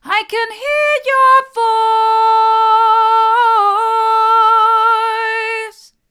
i can hear your voice hard, vocal

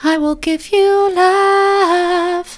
i will give you love soft, vocal

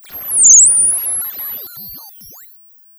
Sound of a bird tweeting nicely

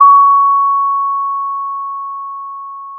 pop, Sound of a school bell

